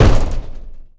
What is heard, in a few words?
Boxing Ring Bodyfall